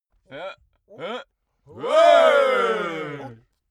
bawl short

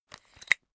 umbrella telescopic extend

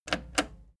plastic button slack click slow

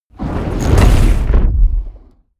fire whoosh experimental medium

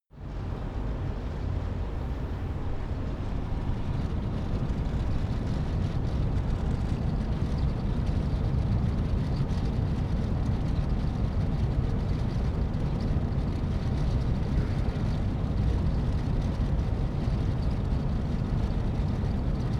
office ventilator

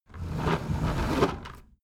window roller shutter close soft single pull

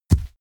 horse step grass

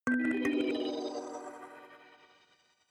notification kalimba magical third up

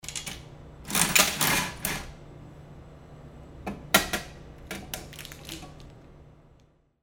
medicine vending machine

